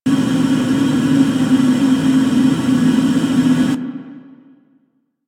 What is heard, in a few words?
Water Through Pipes Loop A; Warframe; Ambience; Grineer; Grn Mining Shaft